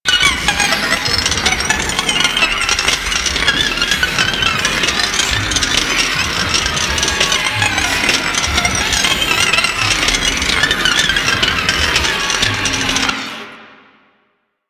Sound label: Proto Infested Mutalist Speaker Full Broken Attack Scream; Warframe; Abilities